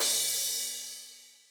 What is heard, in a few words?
crush; osu